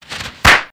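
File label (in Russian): фейерверки петарды петарды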